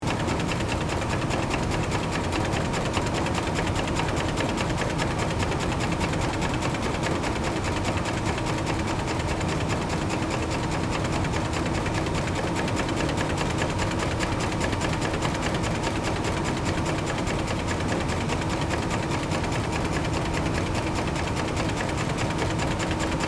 Industrial ambience factory mechanical clanking loop